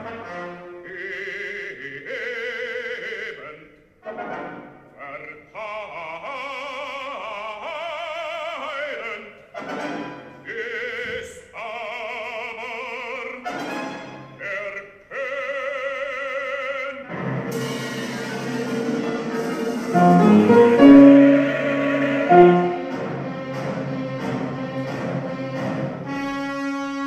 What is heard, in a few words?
tutti; cardillac